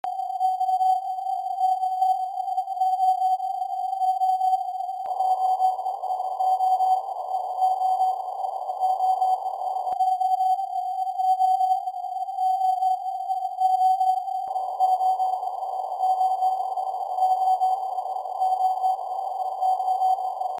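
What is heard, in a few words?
bandwidth variable 750 Hz high knee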